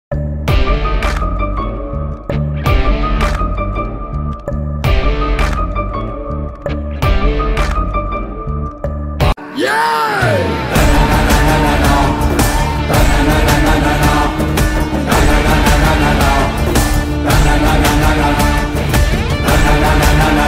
Ringtone Theme Music; Vikram Vedha

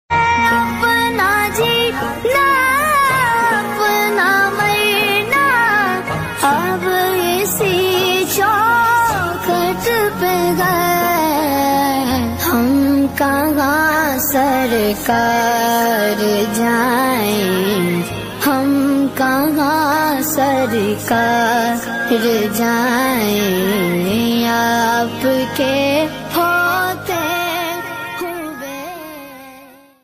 Naat

